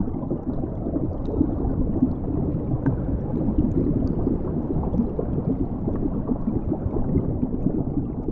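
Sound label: underwater ambience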